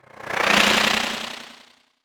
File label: flickering insect whoosh